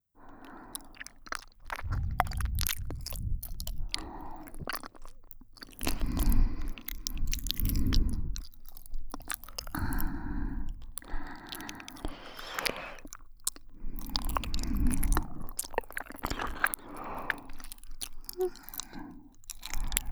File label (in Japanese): エロあま～いお姉さんエルフのＷ耳舐め添い寝